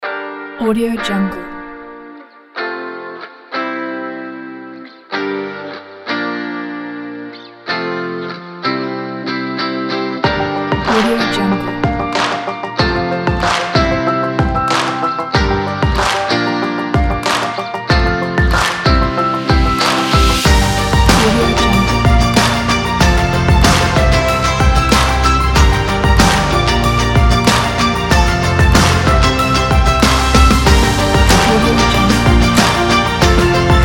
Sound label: inspire